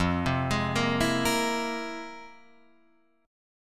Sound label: F Minor Major 11th, Guitar, Drop D